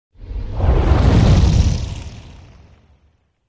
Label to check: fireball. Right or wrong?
Right